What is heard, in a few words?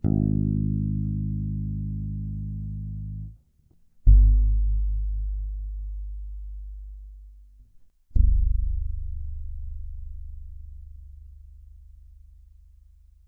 G sharp sequence